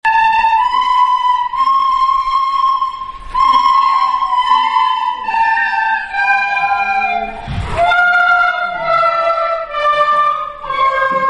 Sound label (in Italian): Flauti Silent Night; Budoia; Scuola Budoia; Natale 2012